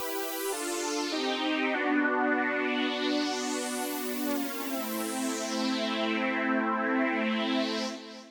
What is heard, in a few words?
Icy Game Over